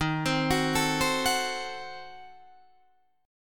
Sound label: Eb Diminished 7th Eb, Guitar Open D